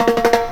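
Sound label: drum roll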